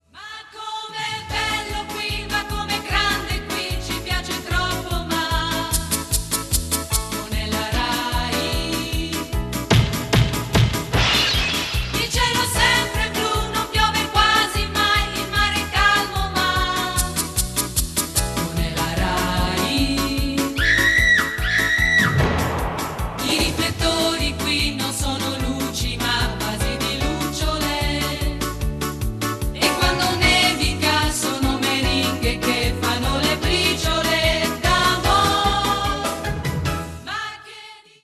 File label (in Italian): Sigla